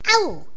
auw; Raft Wars

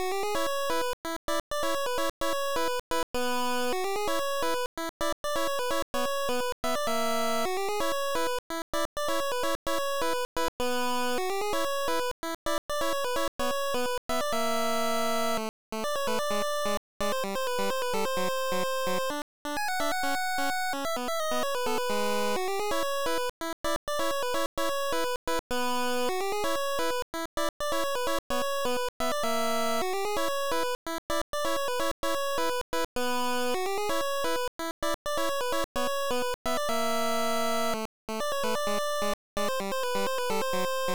Level BGM; adam eve; nes

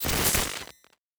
Glitch

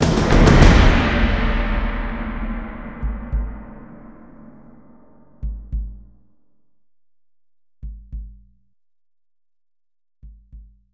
DRUM ROLL SPLASH

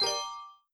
Instr T piano; Backpack Hero

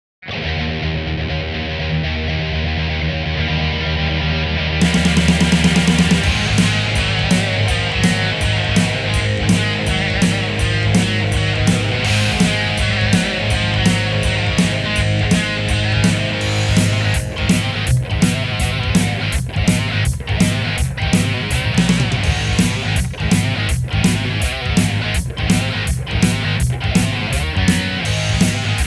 Big Dog Blues; 18 wheels of steel hard truck 2002 pc

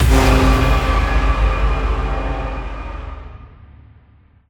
Music Ghost Attack Start

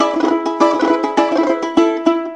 Russian Dance; rayman 2

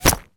Bullet Impact Concrete Brick; JASEM: Just Another Shooter with Electronic Music

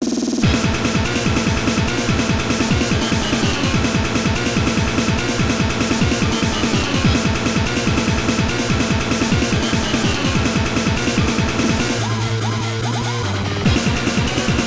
Boss BGM; super spacefortress macross ii; arcade

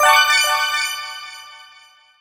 points ticker bonus score reward single

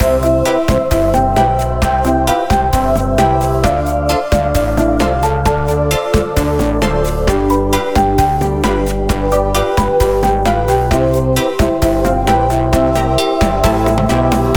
Loop House, soundtrack; Baboon

